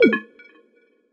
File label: SFX Hud Hint Error; shuimufeifanjing